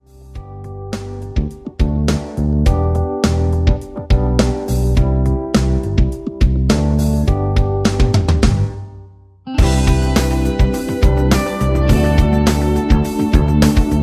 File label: rhythm